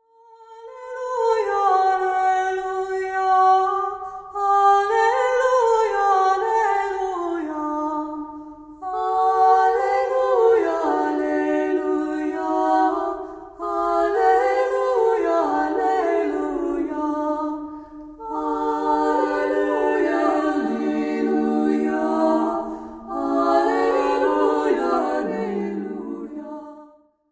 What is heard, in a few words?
Alleluia Round